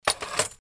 glass build

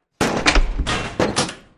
window Door

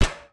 metal plate impact

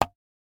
wood hit

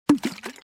water something Drop Into

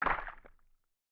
hunting fish hit small

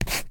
pencil write

